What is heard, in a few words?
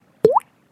Drop; SoundCheck; Overwolf; ICafeCloud